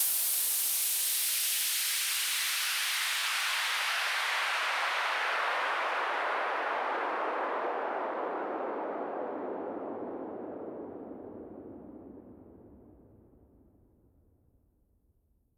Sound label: Sweep down